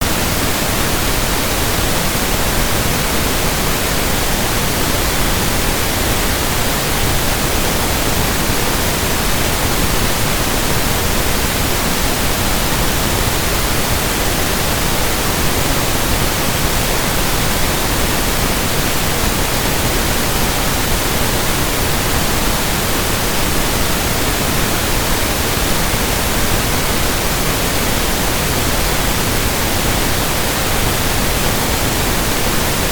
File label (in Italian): rumore rosa; migliorare la memoria dormendo